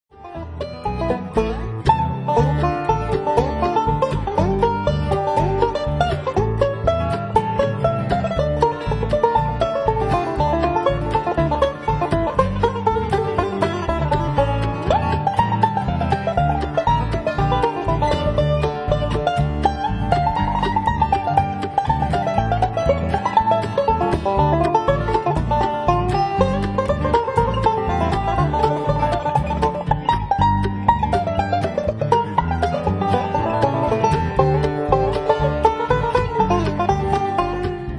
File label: banjo